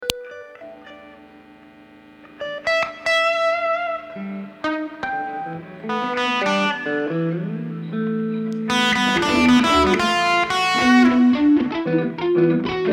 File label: Ambient Guitar Mix